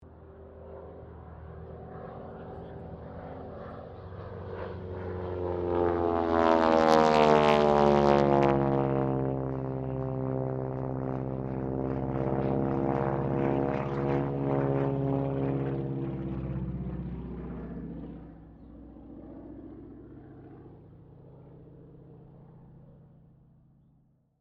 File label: AIRCRAFT AT-6D HARVARD MK III PASS; Temora Harvard MK III; Airplanes; Vehicles